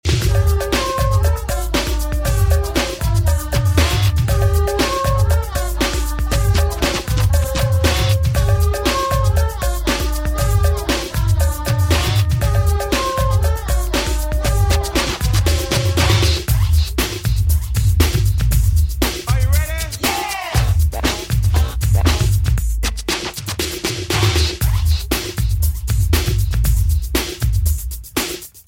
smurf rock

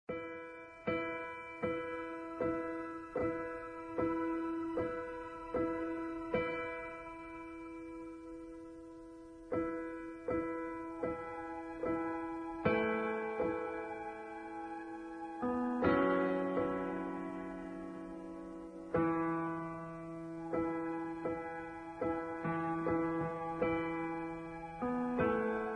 karaoke instrumental

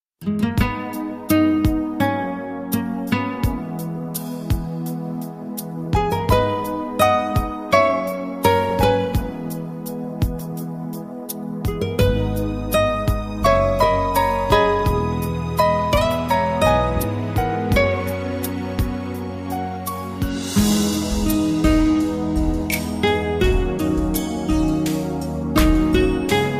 Light Guitar Tone

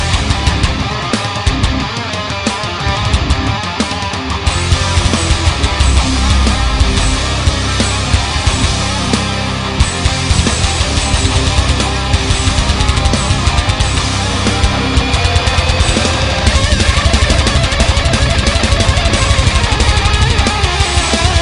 oriental Metal

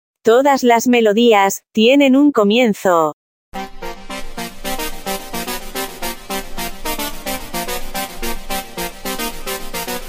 JINGLE; OCHENTOPIA

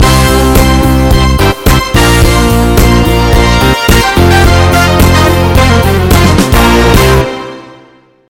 Jingle

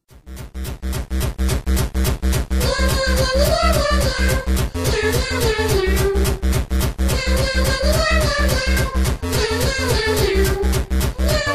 Mew Nya Song

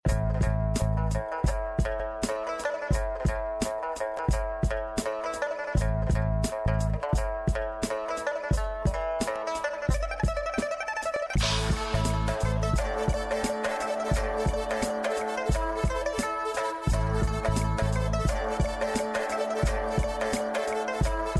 Beat; Sleeping Dogs

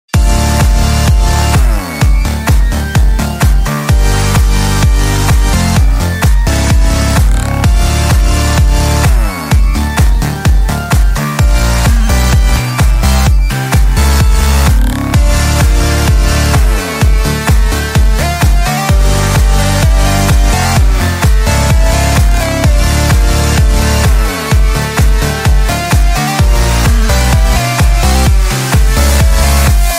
House

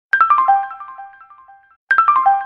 HTC nice Alarm

